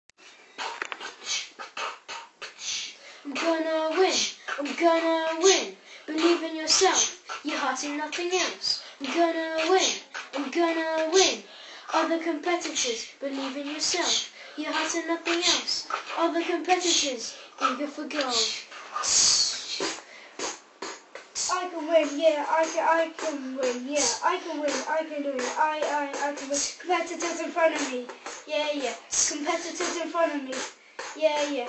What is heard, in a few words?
Olympic rap